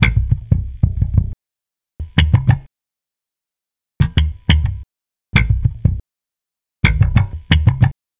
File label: Electric Bass